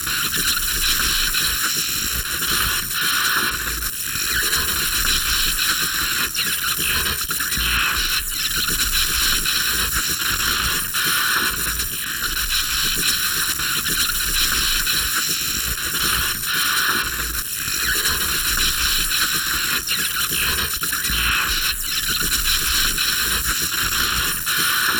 ambient electric

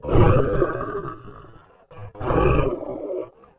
Ambient Camel

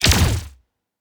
Weapon Shoot

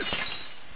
Ice Burn

